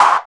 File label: SNR KOCK SN, snares; AMG; Now CD-ROM (Roland)